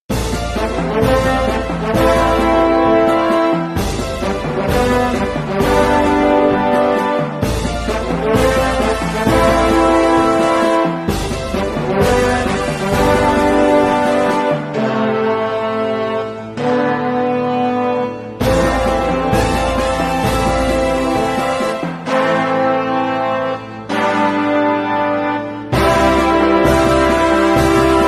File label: fighting; tiga